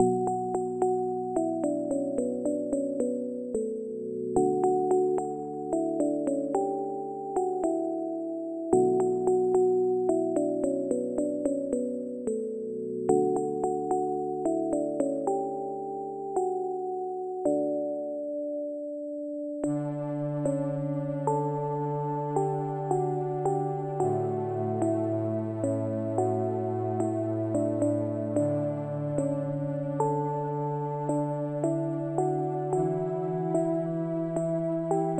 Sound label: music box; quantum protocol soundtrack 2020